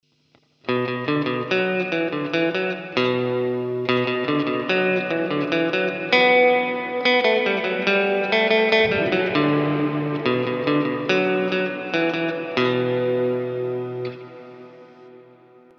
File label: Spring